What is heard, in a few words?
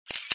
Shutter, Samsung phone; SGH-T369 Linx